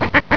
canards; Animaux

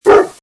Cri; MOBICLIC 65

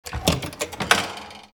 levier

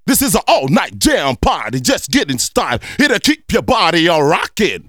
Choeurs & effets; L'Effet Pommier - Volume 04

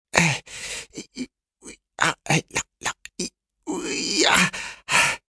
oh hisse; MOBICLIC 65

